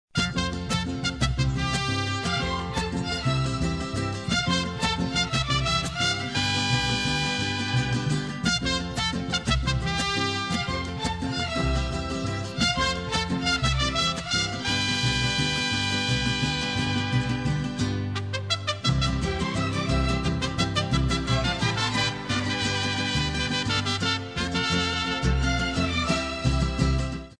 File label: Specialty Mexican Mariachi Mas Jarabe